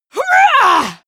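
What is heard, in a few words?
female combat attack long, screams; schreie; menschen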